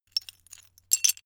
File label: metal pieces clatter